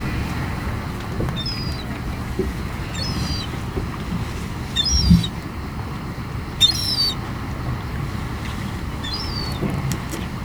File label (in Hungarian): közelről halkabb vijjogások a hajóról thassos, sárgalábú sirály; allathangok; termeszetben; marantz